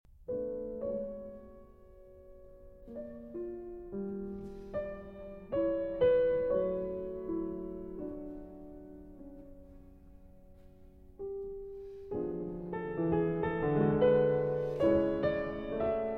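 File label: Variations on a Polish Folk Theme, Piano Works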